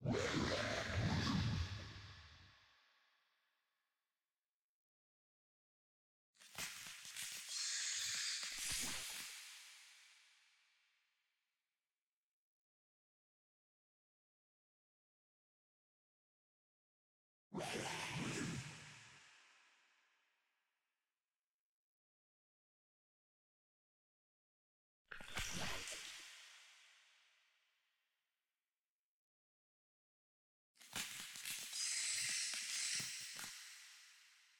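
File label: ambience, Caves Of The Abyss, Pile Of Bones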